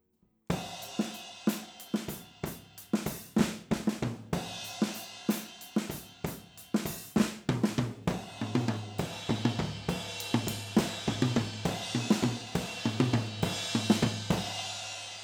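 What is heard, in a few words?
Overhead stereo Drums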